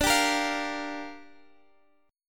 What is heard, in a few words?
A sharp 6th D